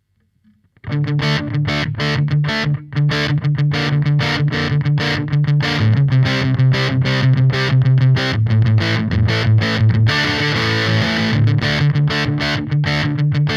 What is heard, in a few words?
Triode disto; lampes